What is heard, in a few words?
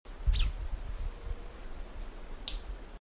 Manacus manacus